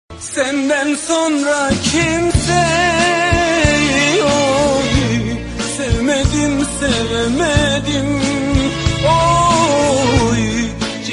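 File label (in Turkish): turk zil sesi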